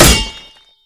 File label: metal hit high armor low damage; Mount&Blade Warband